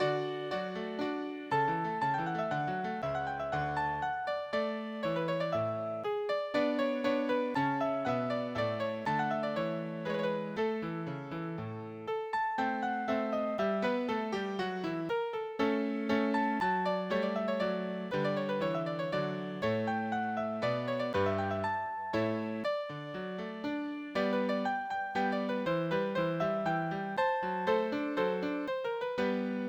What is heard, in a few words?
Minuet Trio